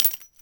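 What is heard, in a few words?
kar98 shell case fall; WAR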